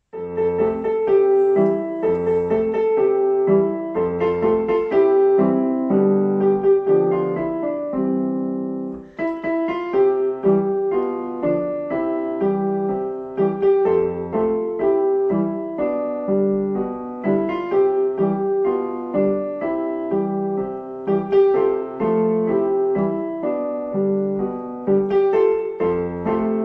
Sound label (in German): playback schullied